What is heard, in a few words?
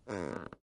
Wood Creak